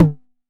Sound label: 909 TOMS; Wizoo - Platinum 24 Electronic; 909 TOMS P1